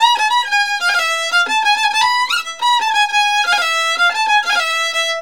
VIOL R, Balkanish Traditions; USB Soundscan vol.63; AKAI

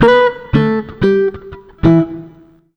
120 JAZZ, Electric & Acoustic Guitar Loops; USB Soundscan vol.04; AKAI

